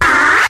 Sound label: ah